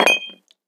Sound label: weapon molotov impact; physics; weapons